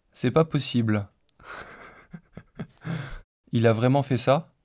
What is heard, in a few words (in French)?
rire synthese basique